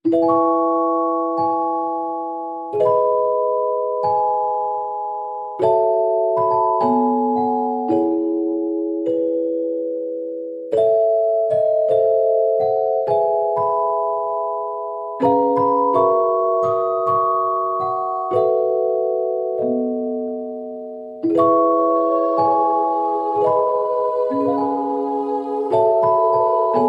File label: No Glockenspiel